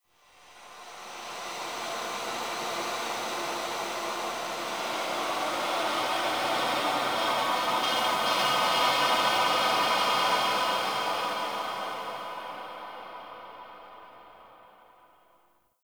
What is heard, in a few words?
Silent Rose Hub Texture, Ambience, Dungeons, Under City